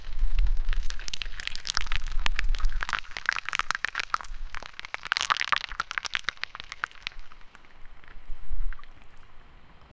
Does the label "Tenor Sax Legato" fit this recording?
No